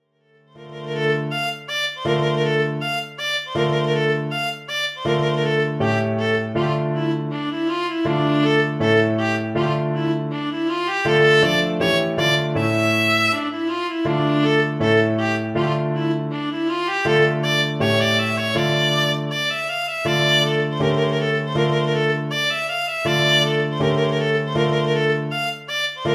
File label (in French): tambourin; j ph rameau